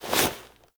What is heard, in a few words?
Foley Misc; Warframe; Operator